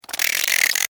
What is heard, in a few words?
Insect Voice Anger, Insect, Voice, Anger, Wet, Clicks, Vocalization, Bug